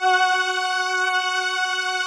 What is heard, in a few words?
VP-330, String Machines